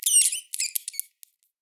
rats; ambient; creatures

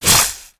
attack tell, headcrab black; creatures; vox

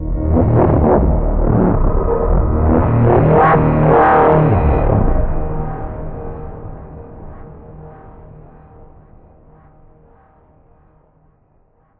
fall warp; Half Life Alyx; music; vault

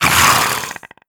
alerted, headcrab; headcrab classic; vox

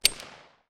glock element click; glock18; Counter Strike Model Pack; CS2

